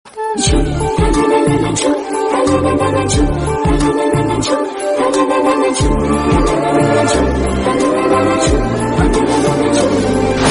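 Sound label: background music Ringtone; Badrinath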